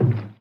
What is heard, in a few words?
Rebound